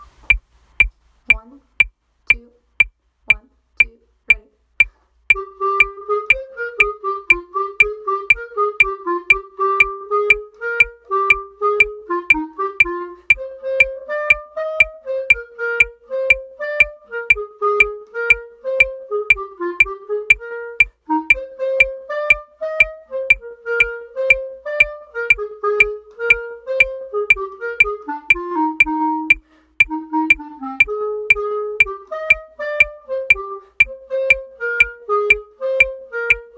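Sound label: baritone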